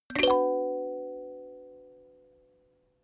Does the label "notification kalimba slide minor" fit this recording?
Yes